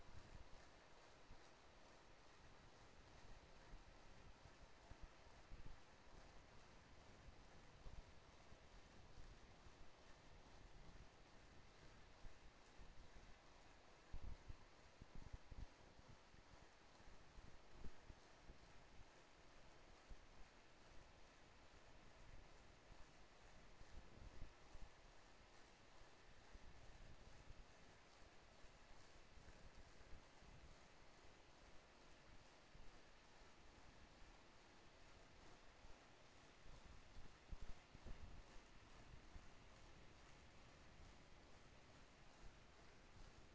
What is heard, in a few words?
HYDROPHONE 16000Hz; JASCO AMAR HYDROPHONE 740